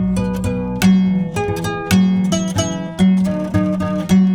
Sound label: KORA; Sonic Foundry (Sony Creative Software); Continental Drift Aftershocks; African